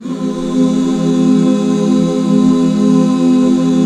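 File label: HAL F minor right, Back Chords; Sound & Vision - Gigapack II CD 1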